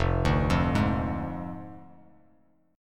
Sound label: F Minor 6th F down Bass Standard; Fm6; arpeggiated; JGuitar